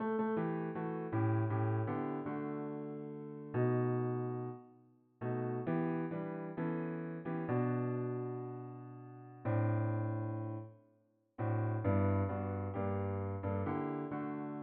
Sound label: SATB Bass Predominant